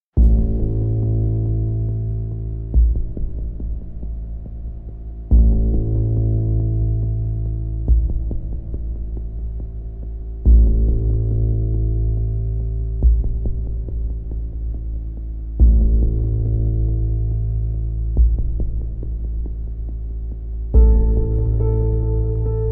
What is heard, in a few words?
Piano Version